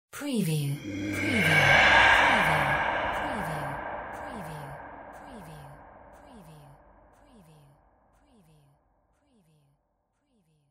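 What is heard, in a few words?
SCIFI WHISPERS SPOOKY REVERSE; windy beach audio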